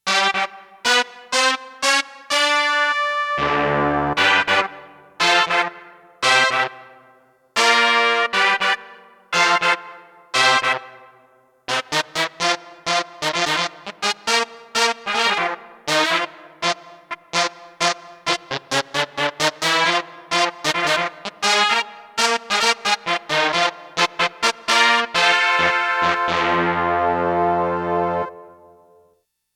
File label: BRASS, Roland JX-1; Factory presets